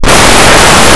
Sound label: armoir; Blue Heat